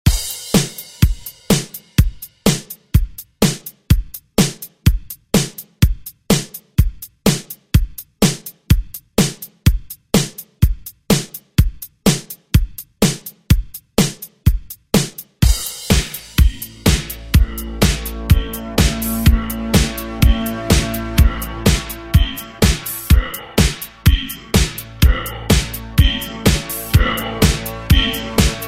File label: 80s Re Drum; DJ Mhark